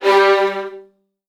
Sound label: VN 16TH, 1G1; ILIO; Kirk Hunter